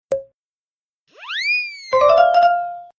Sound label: farm throw right